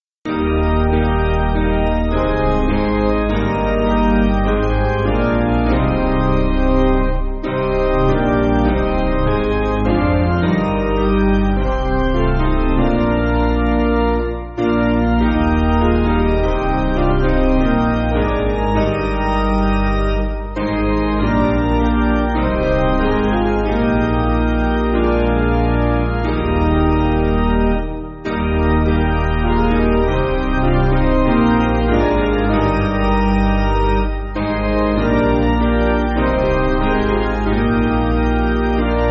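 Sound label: Piano, Backing Files for Churches; Eb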